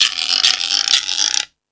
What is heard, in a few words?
Timbale, E-MU Pro-Cussion